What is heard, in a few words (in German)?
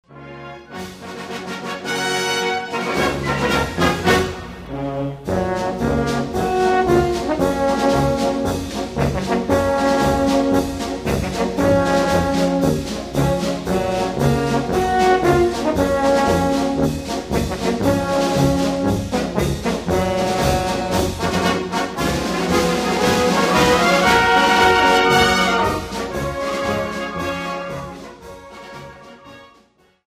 blaskapelle marsch